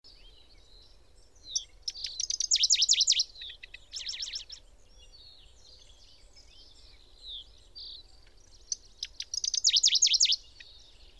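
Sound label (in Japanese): shima sennyuu, bird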